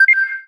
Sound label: shutter; Samsung Galaxy-S8; VisionIntelligence